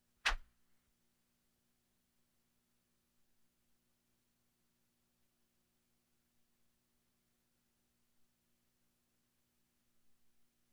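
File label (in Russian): пролет; воланчика; бадминтон; звук полета воланчика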